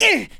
Male Grunt; Lucius II: The Prophecy; macOS

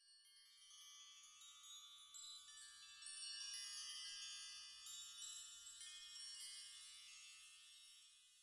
Calm

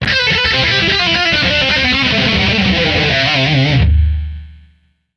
Guitar Shred

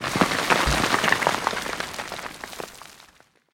Dirt Fall; silent hill homecoming 2008 gamerip pc ps3 x360

